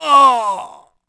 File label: Death Vox; Civ4 warlords